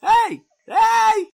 Scream

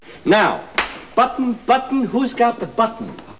Button

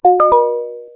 Discreet tone

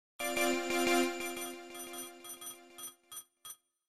Nokia ringtone; Floating

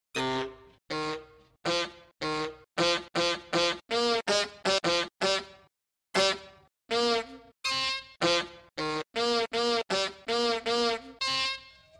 Horn Cover

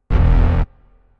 Drone Standby

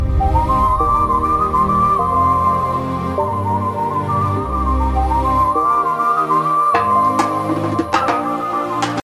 FLUTE

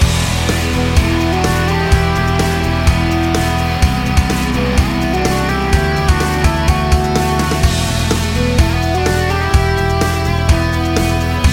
game music

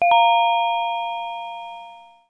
Loud Tone

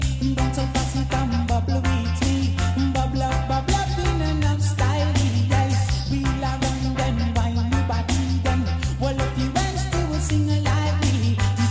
dancehall posse; reggae

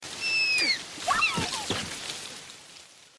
dilophosaurus

